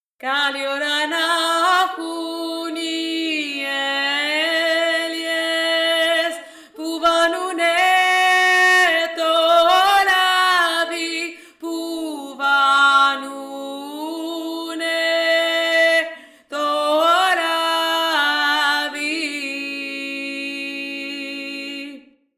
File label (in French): voix 2 principale